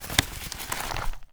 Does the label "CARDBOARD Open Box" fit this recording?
Yes